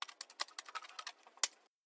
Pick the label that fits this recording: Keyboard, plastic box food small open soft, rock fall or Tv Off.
Keyboard